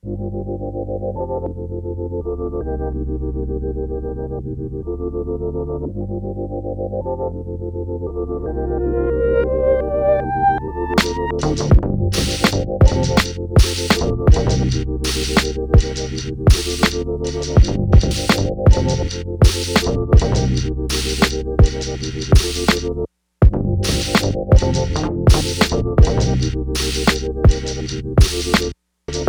Vintage Provider MPC

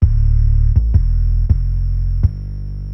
Distorted 8 Bit

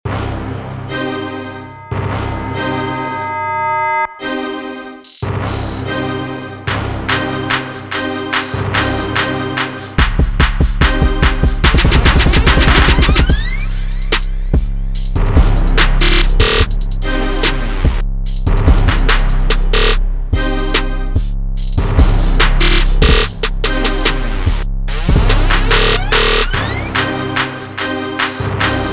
Trap Kit; MVP Anthem

